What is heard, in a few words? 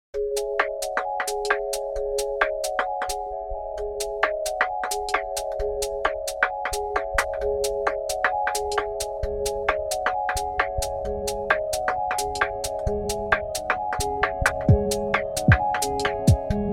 Mashup